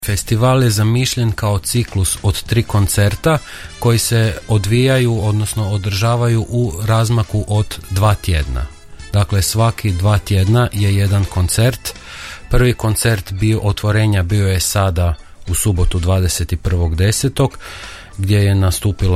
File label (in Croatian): ORGULJE; Novigradske jesenske orguljaške večeri